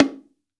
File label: BONGO, STEREO BONGO, Cuba